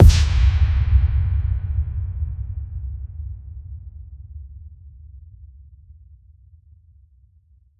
impact hit sound effect; social media

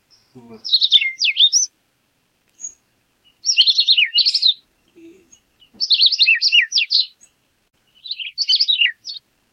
bird speaking the word

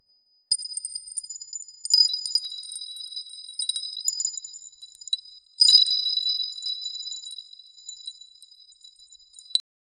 neural network crystals

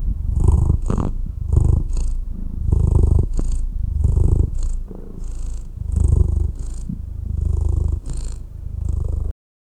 animal cat wild puma purr

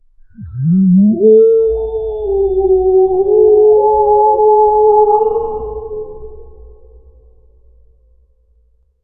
realistic underwater hump, whale

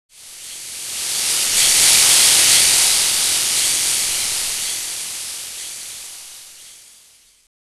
heavy goo squish sound, slime

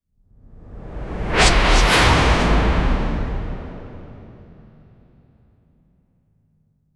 whoosh transition

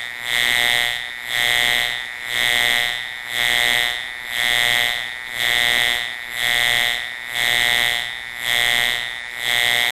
navy alarm klaxon buzzing

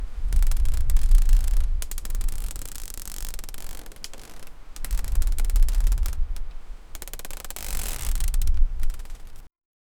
creaking ropes wind sails; nautical

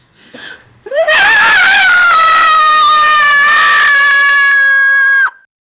a woman crying; human emotions

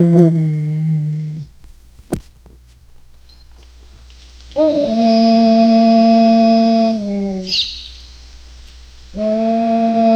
a great honking moan a

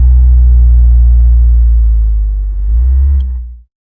bmw m4 comp tuned mildly, car

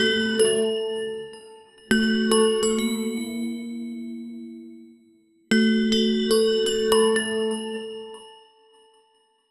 a cold digital bell pluck

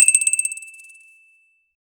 a short satisfying chime

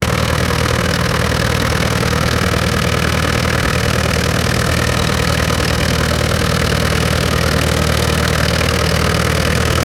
idle low speed boat motor; watercraft

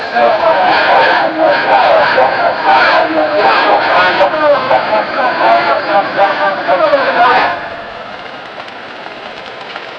sounds of a revolution, clapping

